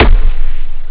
kick

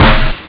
snare

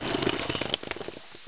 SPLAT; homm2

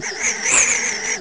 wind

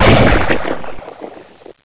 EXPLODE; homm2

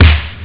kick

